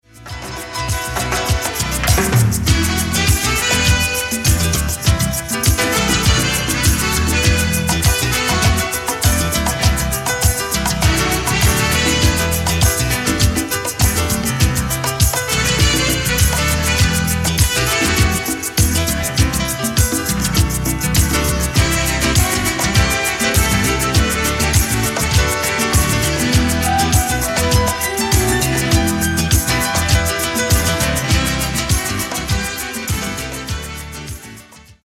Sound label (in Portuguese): Marimba Do Mar